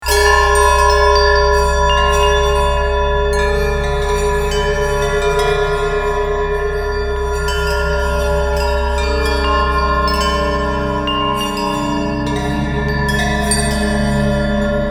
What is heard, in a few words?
big bell clatter